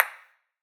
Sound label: Ping Pong; Ed Edd n Eddy; Adromulus Pack